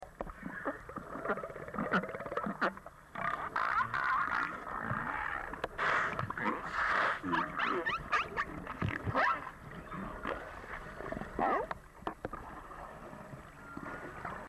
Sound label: social sounds, cetos